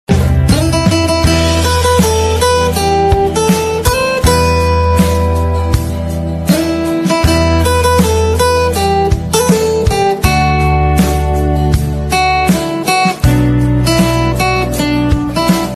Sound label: guitar cover instrumental ringtone; ddlj